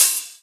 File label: Open HH, Cymbals